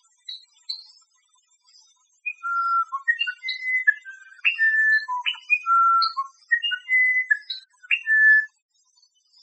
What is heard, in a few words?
Wren detail; Sjoerd Mayer; Musician Wren